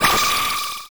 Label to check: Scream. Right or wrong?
Right